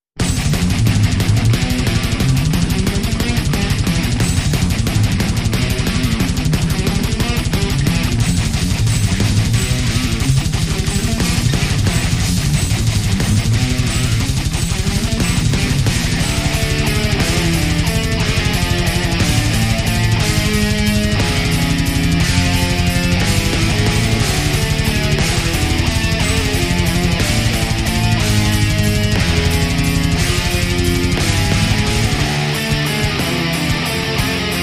Death and Black Metal Theme

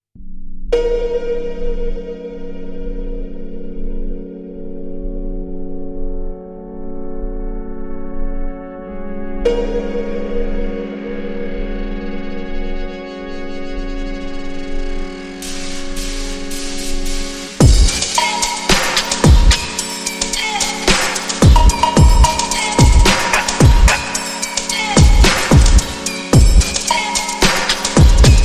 Slowed Reverb

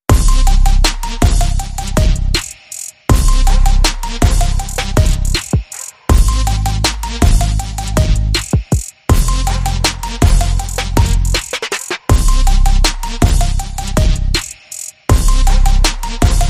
No Vocal FX

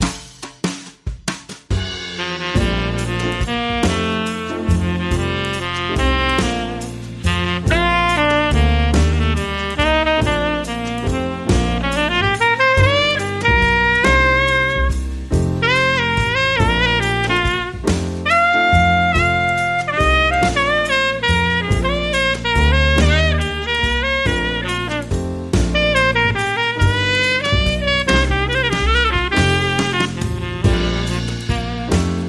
Jazz Waltz Saxophone Happy Birthday

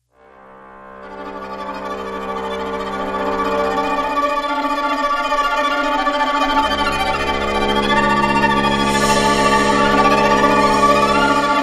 Crazy Violins

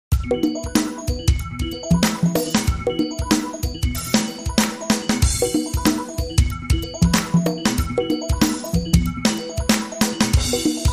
Jazz Tech

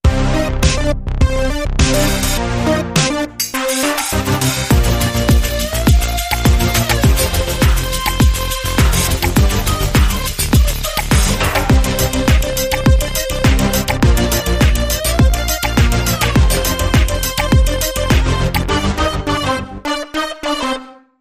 Seven Synths

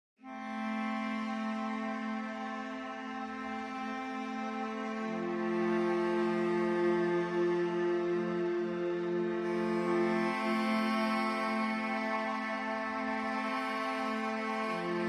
Low Woodwind

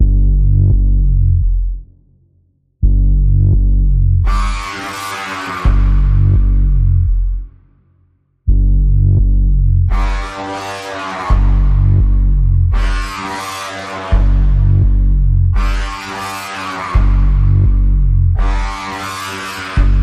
Insidious Pulse Bends G, Key of G

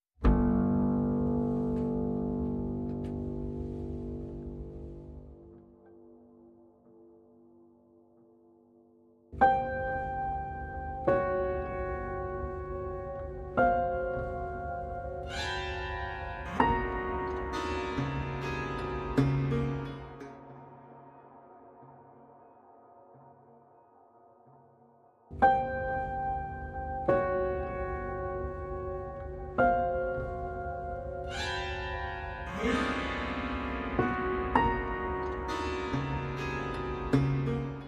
Picked Piano Only

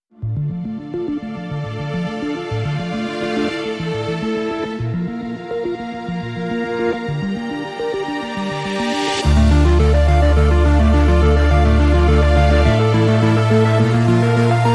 Lost in Silence No Drums; 30sec

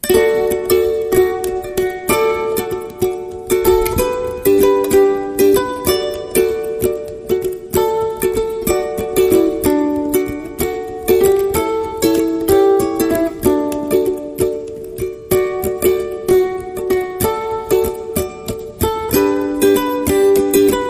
O Holy Night Ukulele

